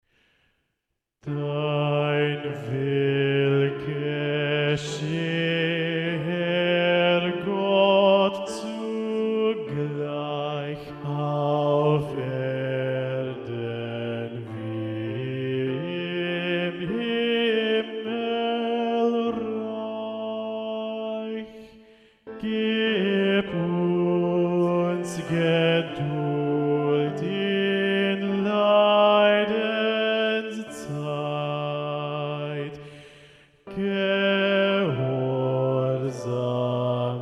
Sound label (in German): Dein Will gescheh Bass